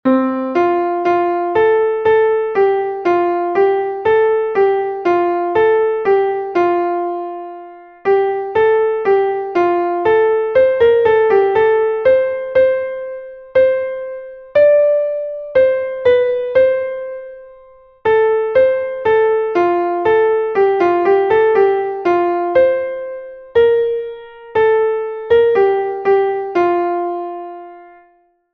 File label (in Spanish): soprano, himnos en piano; 80 LOORES DAD A CRISTO EL REY; cantos del camino